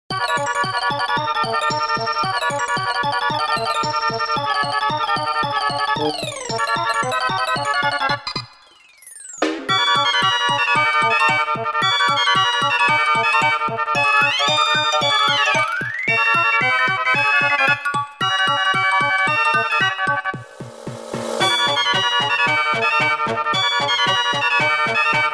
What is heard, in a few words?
fight music; dota2